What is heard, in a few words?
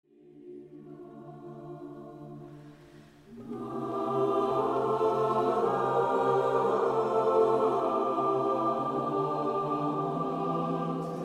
Madrigalchor Liszt Salve Regina